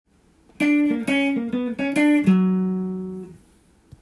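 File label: B minor melody, guitar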